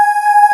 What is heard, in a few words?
halo pad synvox c5; Tank